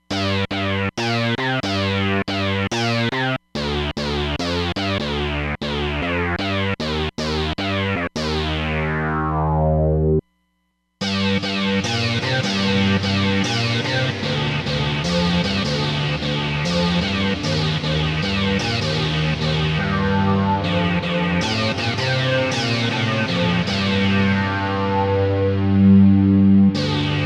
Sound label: Chorus Reverb; Roland DEP-5; Factory Patches